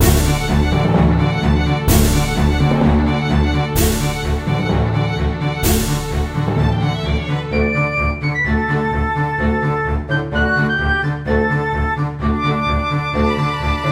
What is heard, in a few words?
Battle; Golden Devil Monster Friends RPG; Android